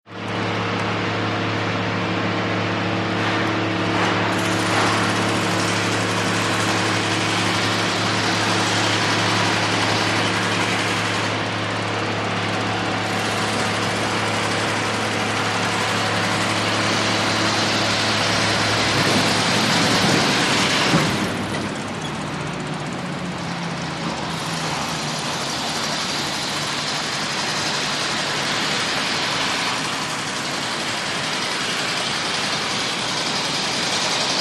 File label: Riveting Jack Hammer, construction large